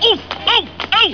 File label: OOH; Teacher's Pet